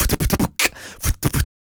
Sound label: Human Beat Box, 140; Loopmasters